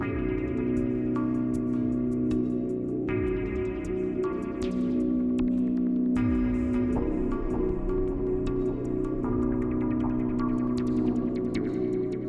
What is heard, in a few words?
pad; Myst III Exile